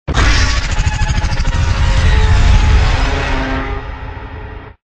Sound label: Swarm Effect